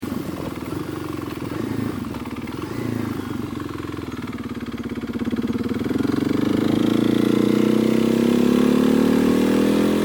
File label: bad engine; klx 250s; i think my engine dying